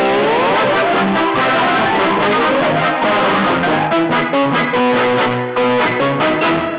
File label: cartoon short